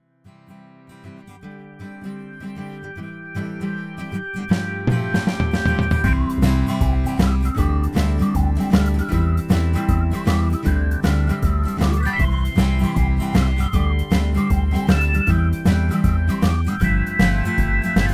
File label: Goodbye song karaoke